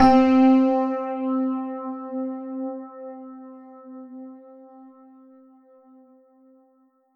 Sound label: yamaha mu90r phase ep c4